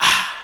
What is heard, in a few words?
"ah" exhale